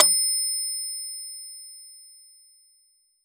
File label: Metronome Bell; Roland BK-5